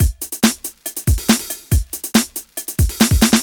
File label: Groove; Beats